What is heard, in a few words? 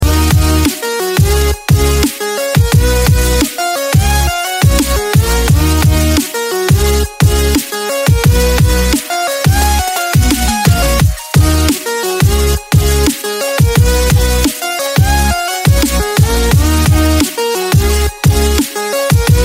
ding